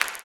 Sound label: CLAP, blues & soul; ILIO; Vocal Planet